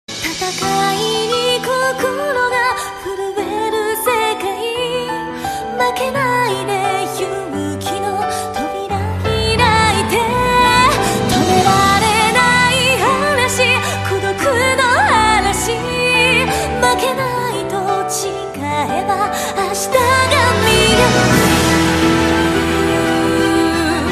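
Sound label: tears breaker with piano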